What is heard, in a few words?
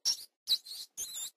rat idle